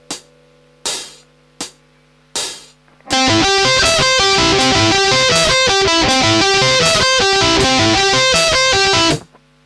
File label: advanced arpeg